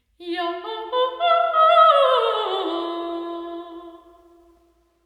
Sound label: st albans sing, lady chapel; cathedral